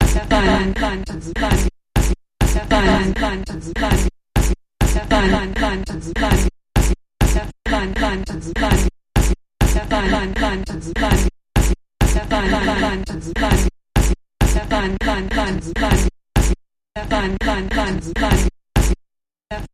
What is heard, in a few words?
Sampled Voice Percussion Variation Medium Version